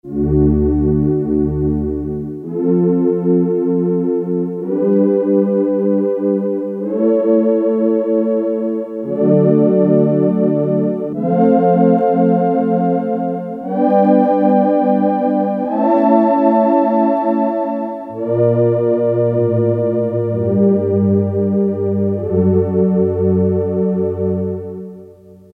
Chorus, Casio CT-370; Tones

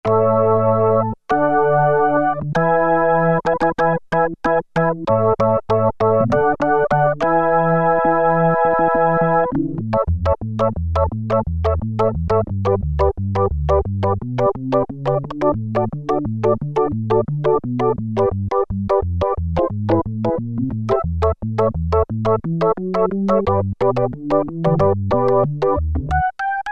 Organ, Roland Juno-60; Factory presets

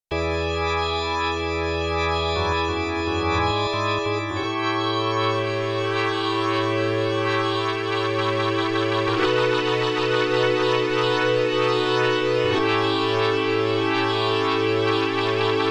Dampr Rtry Org, Korg Radias-R

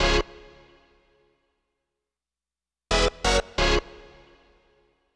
Stabs

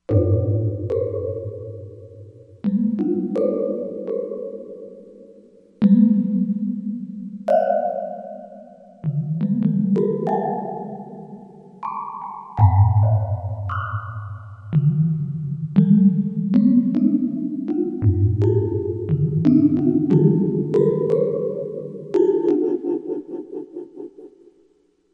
Submarin, Korg MSC-07S Synth

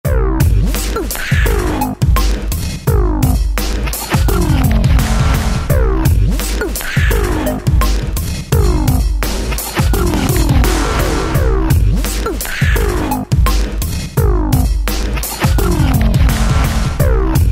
Bristol Beat; Korg ES-1; Factory Patterns

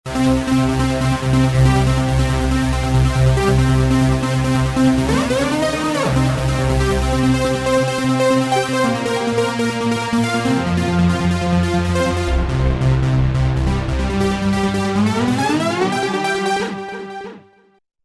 Roland V-Synth; Daybreak; Factory Patches

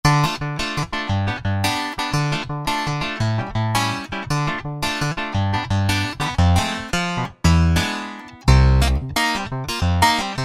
Comp Clav Rls; Roland SRX-05 Supreme Dance

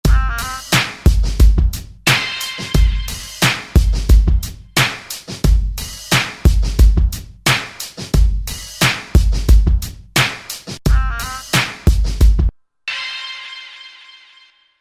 Saxy Hit, Roland SR-JV80-12 Hip Hop Collection

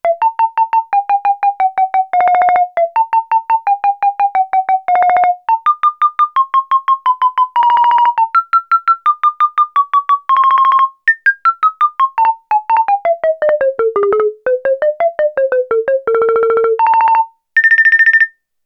XYLOPHONE, Roland SH-101; Factory Patches

